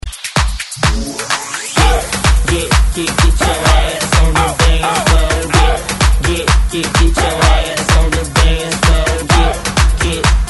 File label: HYPE DUTCH REMIX